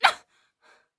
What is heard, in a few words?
Humans Female shout of pain; Screams Shouts 2; soundbits